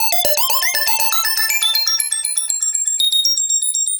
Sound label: TRANCE